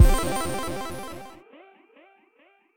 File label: Skill Acquire